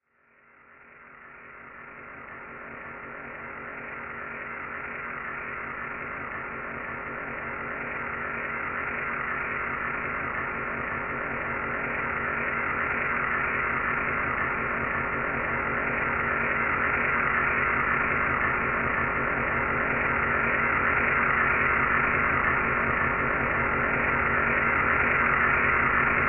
Space Ship Hovering; spaceship fx

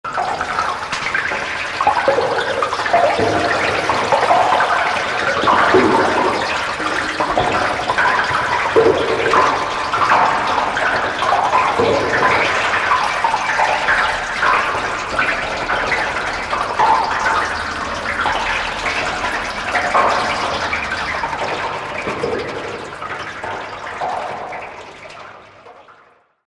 Horror Bubbles Eerie

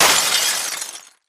Mirror Crash; housewares

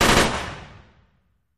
Machine Gun Single Rapid Fire Burst

